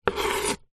household knife scrape vegetable cuttings on wooden chopping board